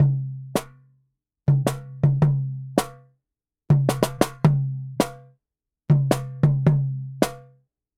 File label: Jungle Kit, African; Sonic Foundry (Sony Creative Software); Continental Drift Aftershocks